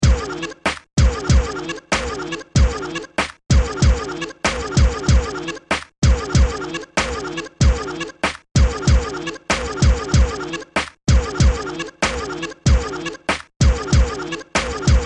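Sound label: hip hop instrumental beats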